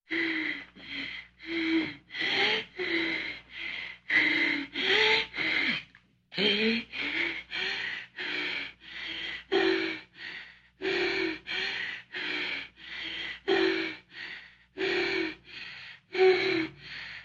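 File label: tyazheloe zhenskoe dihanie ot udovolstviya, heavy female breathing from pleasure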